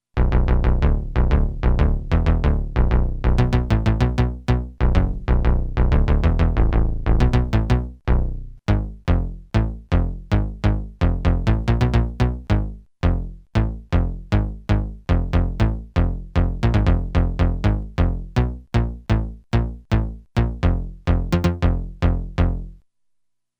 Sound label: Moog Bass